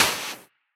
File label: energy metal, guns hits; Weapons